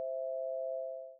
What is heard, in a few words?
victory; archive-world